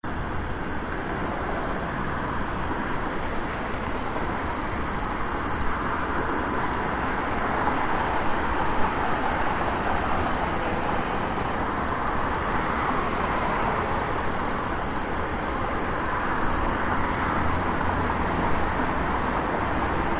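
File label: zvuki nochi, night sounds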